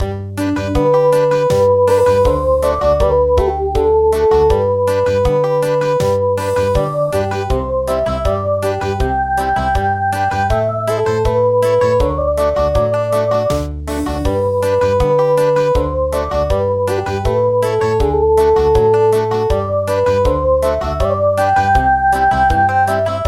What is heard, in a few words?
Country